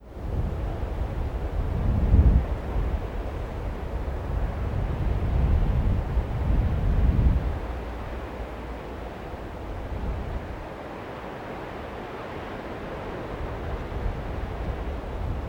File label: Namibia Wind